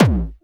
hard bass drumz

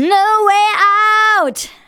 VOCALS SUNG; Techno Trance Essentials

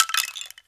sticks, Drums & Perc; AKAI S6000 CD-ROM - Ultimate

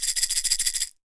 PEYOTE, PERC; AKAI S6000 CD-ROM - WorldSounds

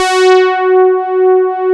PA, ANA PAD

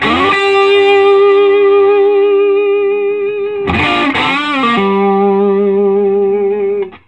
SOLO G, Vintage Blues Guitar

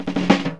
FILL; Zero-G; Funky Elements

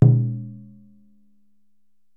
DJUN DJUN, African Perc

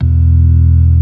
ORG. E1; B3 FULL

